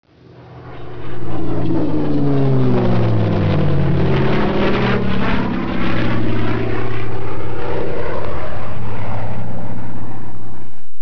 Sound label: OVERHEAD, ambience; dod